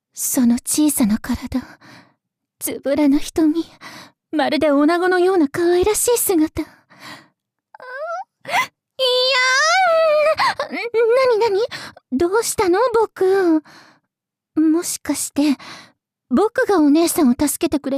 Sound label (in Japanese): 耳に息を吹きかけられるの…嫌い?